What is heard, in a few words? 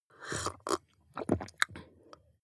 drink glass milk slurp small